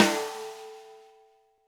Snare, Roland TD-20

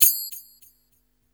RNB 100 PERC; Burning Grunge Hip Hop; USB Soundscan vol.34; AKAI

